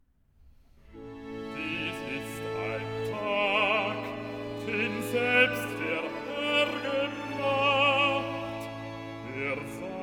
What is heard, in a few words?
recitative